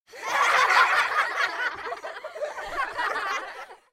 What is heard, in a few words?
laughter